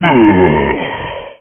die

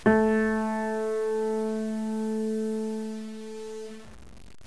piano a

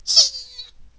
miss

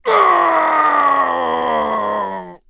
death; darkwing

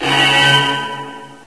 alarm

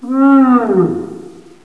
imp die; eqclassic

